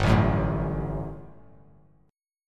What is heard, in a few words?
G Major 7th Suspended 2nd, Bass Standard; strummed